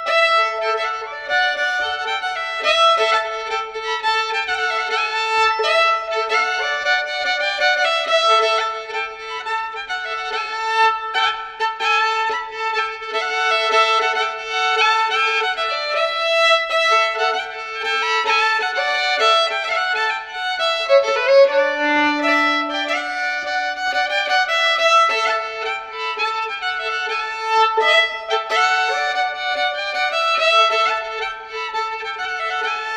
Runnom; efter Embret

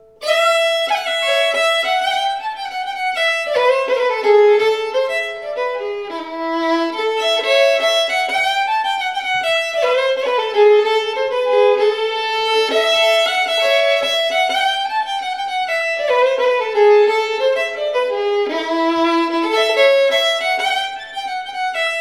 Polska Malung; Skinnaralbin

